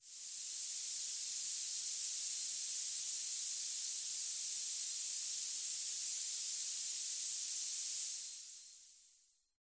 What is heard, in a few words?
spray; operation